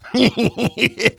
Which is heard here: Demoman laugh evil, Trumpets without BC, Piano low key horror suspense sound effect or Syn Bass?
Demoman laugh evil